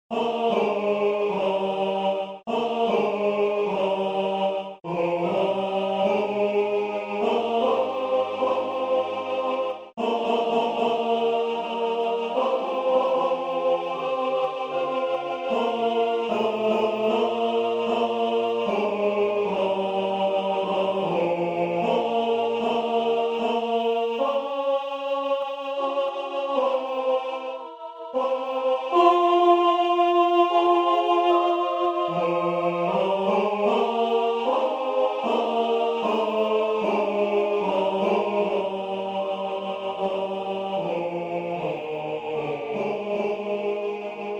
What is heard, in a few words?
As torrents in summer Tenor; E.Elgar